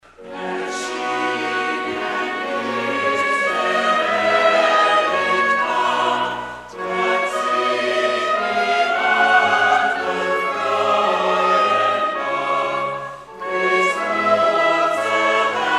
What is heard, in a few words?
Halt Chorale